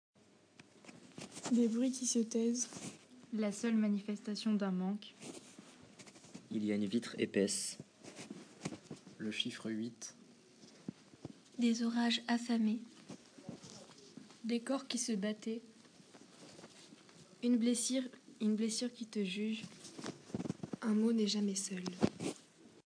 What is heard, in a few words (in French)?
bruits